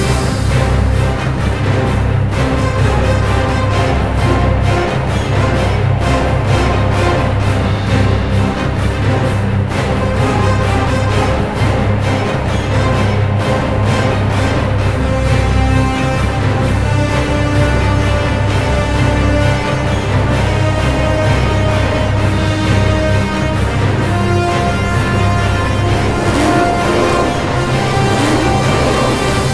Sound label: Storm Loop; Jotun